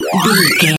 retro magic spell cast

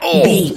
voice male hurt pain

